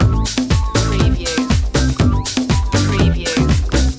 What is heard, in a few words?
loop, latin spanish